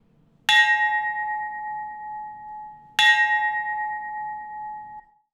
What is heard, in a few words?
Metal Bell (2-tones)